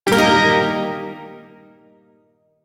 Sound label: Pent Min Chord J; Warframe; Duviri Shawzin